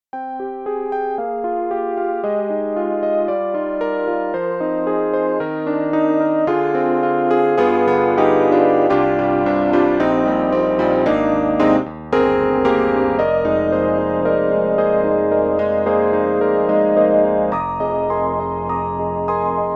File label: Yamaha CP80 Chorus in Motion; Steinberg; The Grand 3